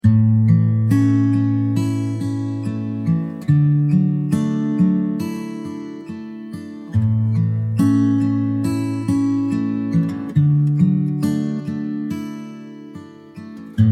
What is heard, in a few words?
reflective folk instrumental